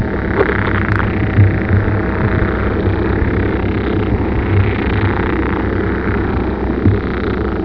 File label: pick frog